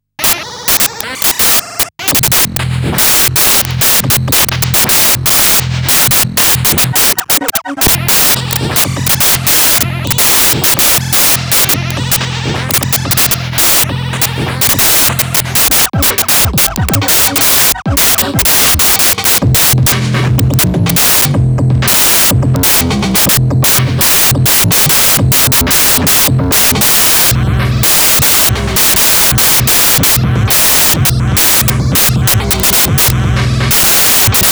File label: industrial mood